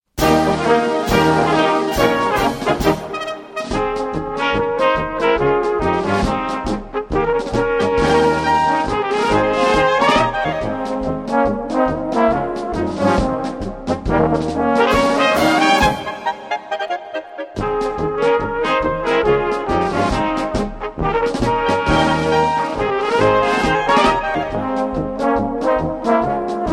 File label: Tango